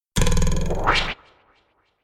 Cartoon style arrow being fired by bow.; Bows and Arrows; Combat

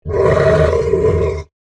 Monster Growl, Horror, Monster, Growl, Creature; Monsters (Sound Effects:Horror)

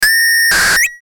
Glitch Bright Squeal Ascend; glitches; Blastwave

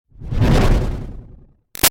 Whoosh Power Pulse Strong; Whooshes; Sound Design; Big Room Sound

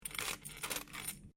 Coin Penny Multiple Move Laminate

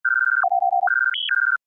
Computer Beep: Odd Effect; Communications; Communication Sound Effects; Communications (Sound Effects:Science Fiction); Stuart Duffield

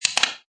Metal Can crunch; Metallic; Multimedia; Lynne Music

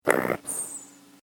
Cartoon Gremlin Snore; Cartoon Voice Sound Effects

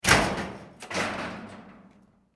household door garage metal shut exterior; Metal Garage Door Shutting - External Recording; Garages

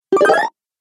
Retro Videogame Fx Magic Beeps

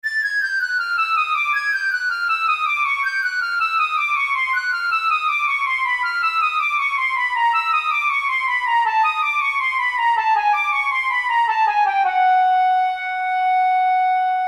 Cartoon Flute Chromatic Descends Falling Musical

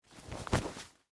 Short Sleeve Adjust, Clothes, Shirt, Movement; Foley; Dressing Sound Effects; BlastWave

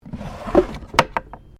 Drawer Cabinet Wooden Small Open; Various domestic drawers; Household

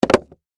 Skateboard Jump, Wood, Surface; Sports; Skateboarding; BlastWave

